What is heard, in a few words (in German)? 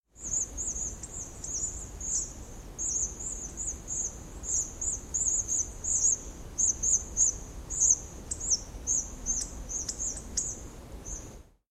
kernbeisser